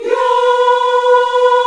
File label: VOX African Yas; Roland LCDP11 Africa VOL-1